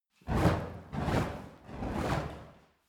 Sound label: fire torch move sequence